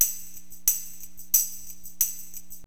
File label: TAMB, Perc